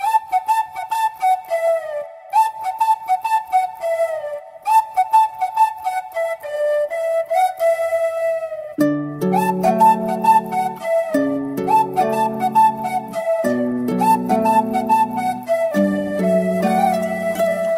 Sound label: BG Flute